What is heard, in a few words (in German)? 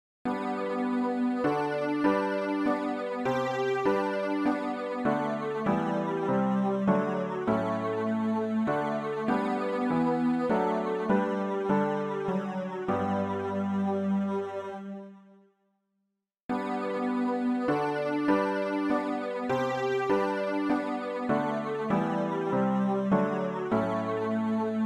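tenor, Übehilfen für das Erlernen von Liedern; ref gesangbuch; rg 259 licht das in die welt gekommen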